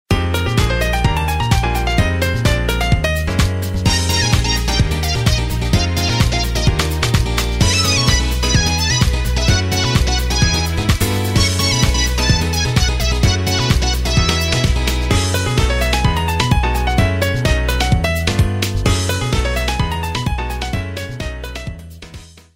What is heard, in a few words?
midi ring